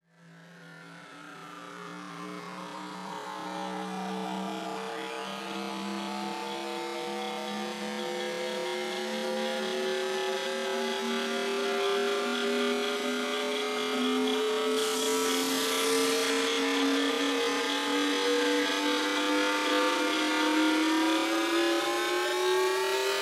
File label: LongFx 138BPM; Sound FX; G#minor; Vengeance Samples; Manhattan Kit